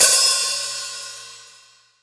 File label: Hat open sizz; Akai MPC500; Hats TKit